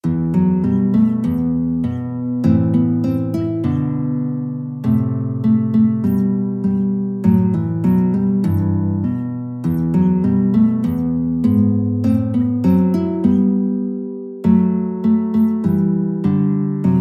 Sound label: national anthem of israel GUIT